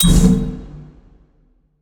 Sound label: portal teleport; smite; conquest s4